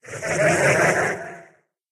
karlik idle, mutants; STALKER; customnpcs

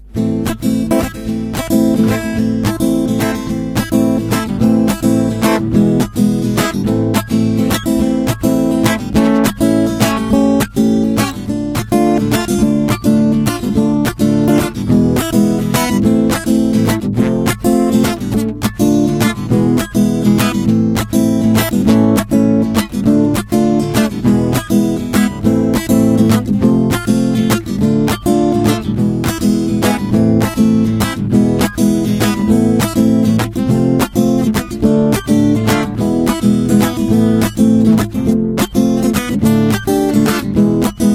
guitar; STALKER

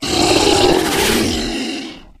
bloodsucker die, stalker; customnpcs

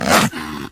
tushkano attack; STALKER; customnpcs

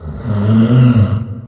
karlik make hit, stalker mutants; customnpcs

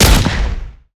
fn fal fire; STALKER

